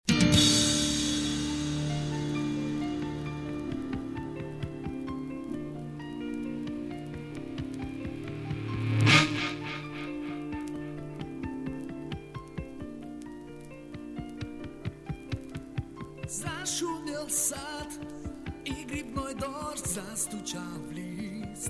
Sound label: rain